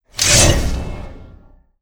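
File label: blade to hand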